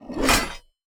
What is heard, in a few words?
butcher strike